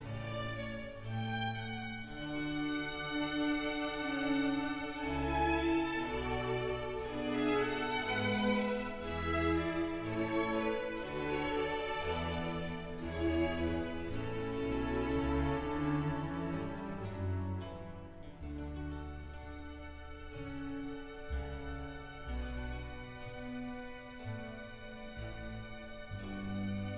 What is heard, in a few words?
orchestral suite no 3 d dur bwv 1068 air